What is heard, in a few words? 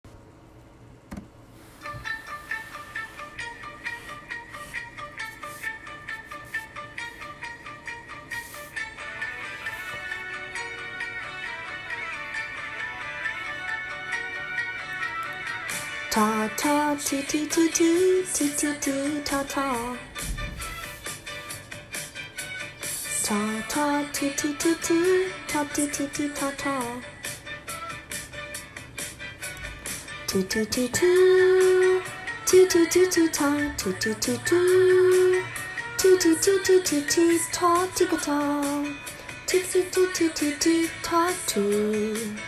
rhythm